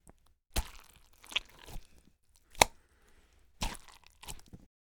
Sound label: Cantaloupe Guts Dig And Slap